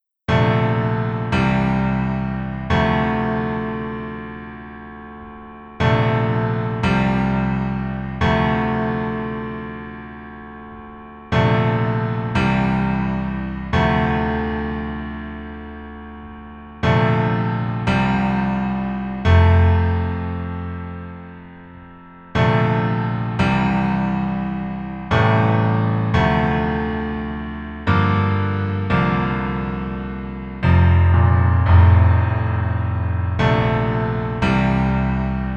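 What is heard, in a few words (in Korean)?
Piano version 남자키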